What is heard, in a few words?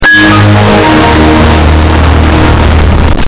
loud windows xp shutdown